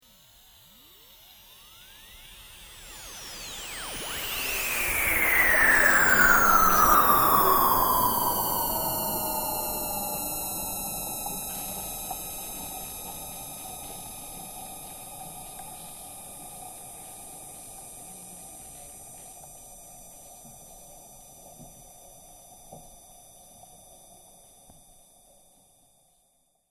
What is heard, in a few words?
SCI FI VEHICLE PASSES; Various Sci Fi vehicle effects; Spacecrafts